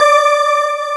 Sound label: Bright d5; BGM; Nintendo; July 2020 Gigaleak